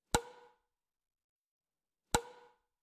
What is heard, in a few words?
badminton